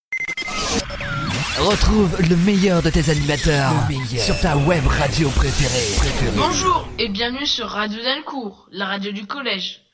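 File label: jingle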